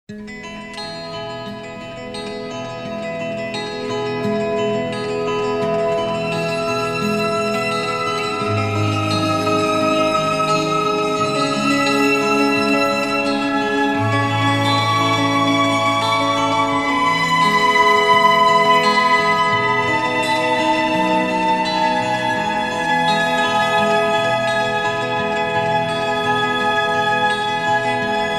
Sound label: instr; к-ф Вам и не снилось